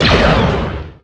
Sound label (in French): croiseur laser; vaisseaux